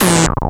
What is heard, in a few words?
Percussive, Drums Perc One Shots; Sonic Foundry (Sony Creative Software)